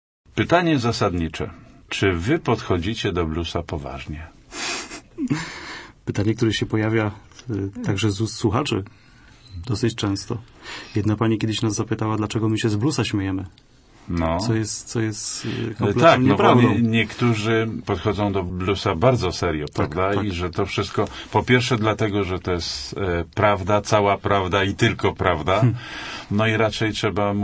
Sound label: blues flow